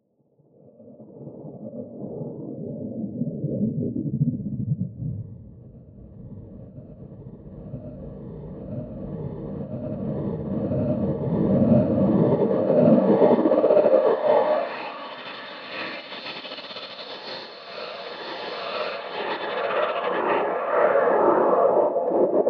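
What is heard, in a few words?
Reverse Gate Filter Delays; Cinematic Metal Impacts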